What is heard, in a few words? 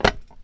metal solid, footsteps